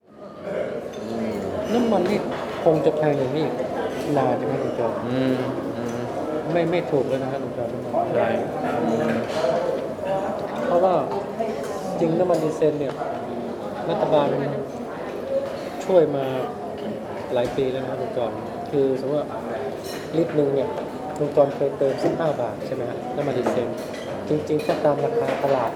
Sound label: waen